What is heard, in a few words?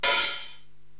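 Hi Hat Open, Korg TR-Rack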